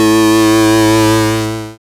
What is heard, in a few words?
SYN, dance; Sound & Vision - Gigapack II